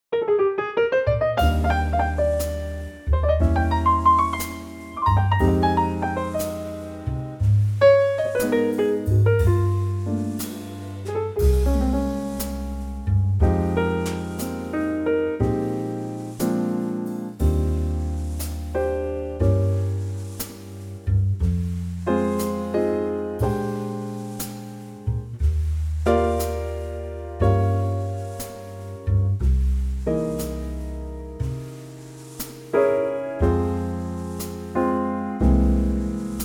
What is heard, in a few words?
Eb trio